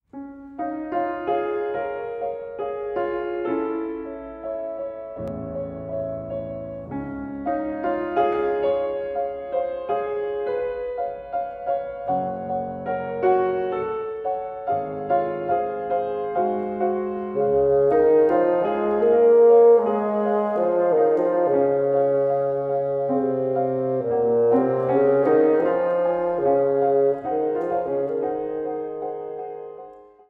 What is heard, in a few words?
Bassoon Piano; Cecile Chaminade; Ritournelle